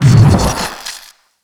mohole discharge

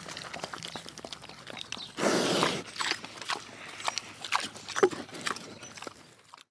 eating; Rust

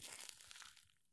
soil walk; Escape from Tarkov

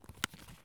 item medkit take syringe; Escape from Tarkov

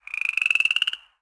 rock frog; World of Tanks Blitz

